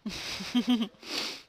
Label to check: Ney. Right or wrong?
Wrong